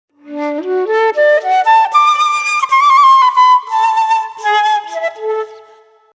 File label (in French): impro flute traversiere; loto sonore